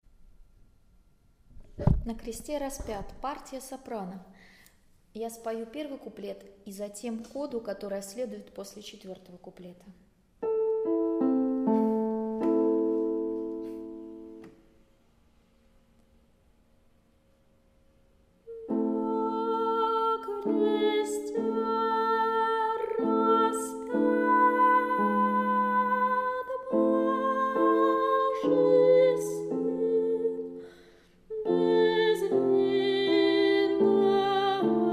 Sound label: soprano; Na kreste raspjat